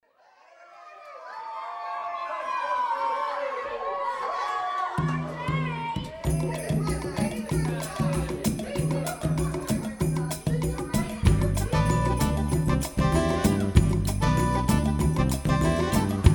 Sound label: Jingle Bells